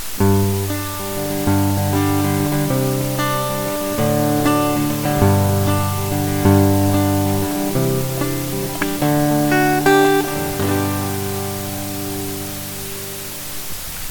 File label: Acoustic Guitar